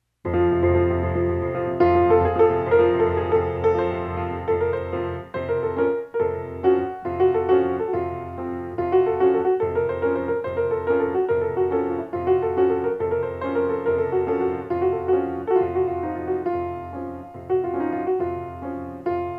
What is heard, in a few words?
Caucasian Dance